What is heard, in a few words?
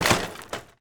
bicycle stumble; anemoi